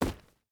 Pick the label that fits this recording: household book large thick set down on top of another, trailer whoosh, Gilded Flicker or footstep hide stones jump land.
footstep hide stones jump land